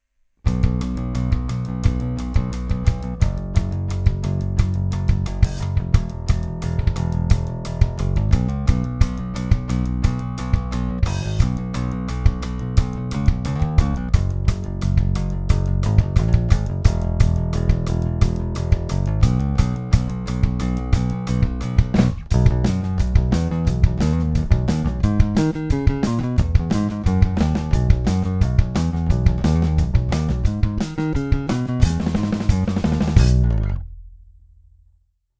Shortscale J Punk Mix, basses

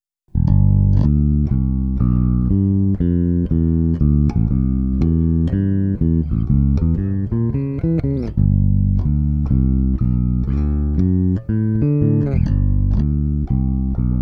Jazz Mix, basses

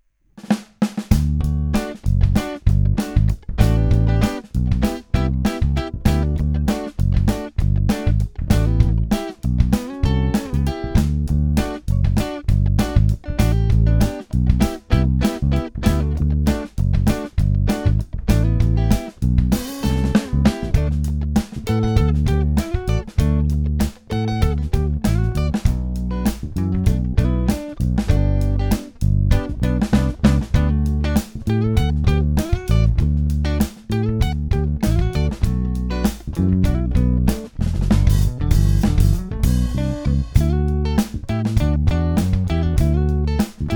Shortscale Soul Mix, basses

